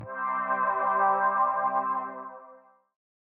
Tune Full Charge

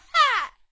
grunt

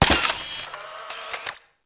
Take photo; LittleBigPlanet 2; PS3

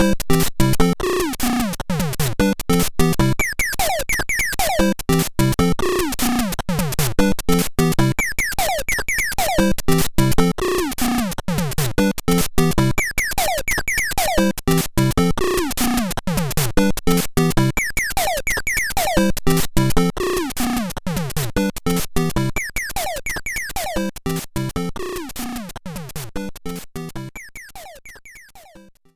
BGM Secret Hurry; Super Mario Maker

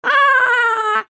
Haha; Troll Face Quest Unlucky; Android

